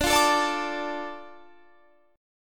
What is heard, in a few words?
D Minor D, chord